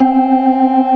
ORGAN, C3 NO PERC; Keith Emerson Signature Series

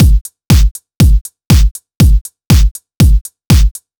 120 Prog Chopz Drums; Zero-G; Soundclash Nu Dance Electro; Combi Loops; 120bpm

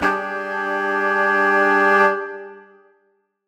Horn Swell Gmin9; undercover samples

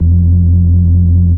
RAVE, RAVESYN

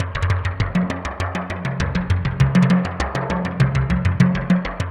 ARP, SOFT BASS ARP; Zero G; Malice In Wonderland

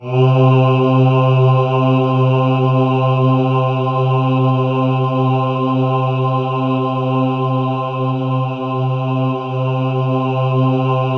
C3, Synth Leads

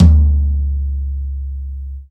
TOM ROUND, Real Toms, Dry Toms; Roland L-CD701

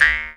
jaw, Didgeridoo and Other Primitive Instruments

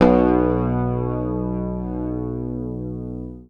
B1, Bass Library, PICK; Sampleheads; Will Lee Bass Library

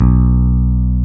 B 2, E-Basses, Roland

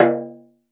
Dof Rim, Roland BK-5 Percussion

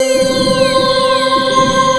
ATMO SYNTH

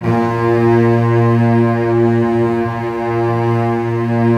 STR SEC, STRINGS; Roland - LA Composer vol. 1

